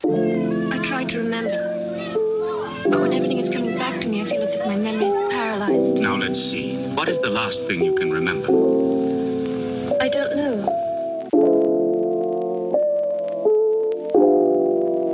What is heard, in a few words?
Lofi Hip-Hop Beats